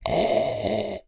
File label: human slurp drink from cup